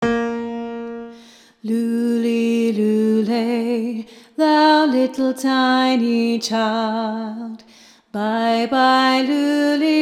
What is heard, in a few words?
Coventry Carol Low